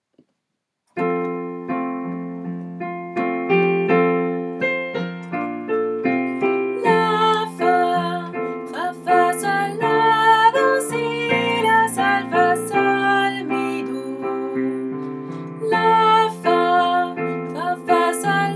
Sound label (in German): mit Melodie